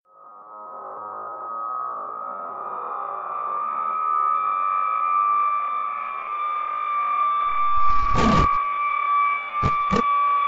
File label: scary electronic; ringtones